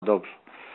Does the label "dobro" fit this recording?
Yes